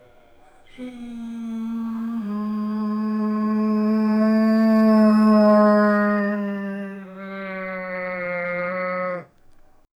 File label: moo for cow; animal call